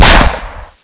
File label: cop fire colt